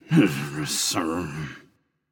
grumble; smite; anubis

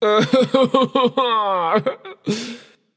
boo hoo; smite; achilles

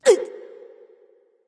grunt hurt; smite; nemesis

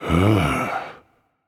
sigh; smite; sobek

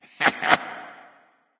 heh heh; smite; ahpuch

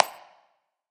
clap; smite; poseidon

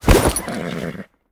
activate; Smite; god Lancelot

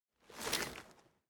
armor; Smite; god Kuzenbo

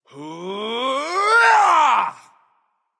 battle cry; smite; voicepack; rama

